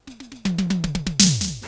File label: JAM FILL, DRUM FILL; Crypton - DrumBase5000